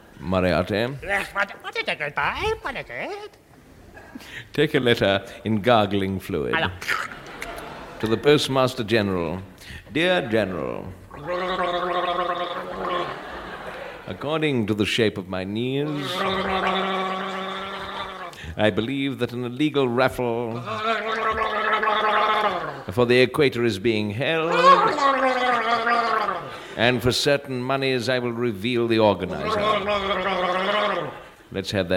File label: Gargling Dictation; Goons